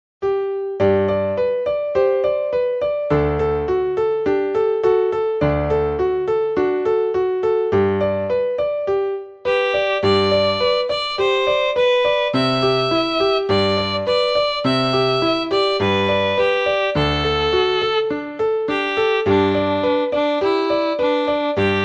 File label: violin